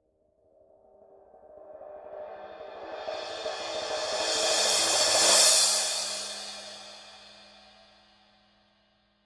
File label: Paiste signature 14 full crash swell